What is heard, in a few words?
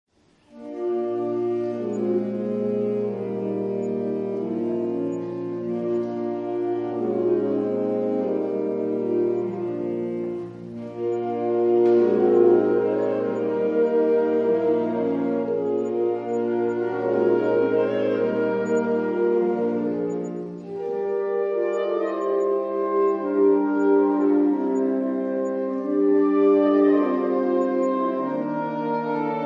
Saxofolie, sax ensemble